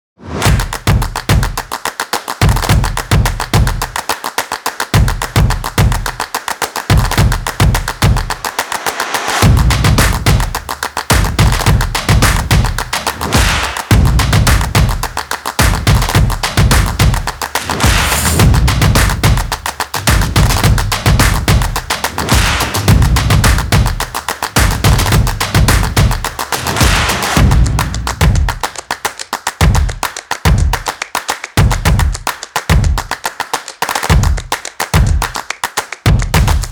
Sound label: Sport Music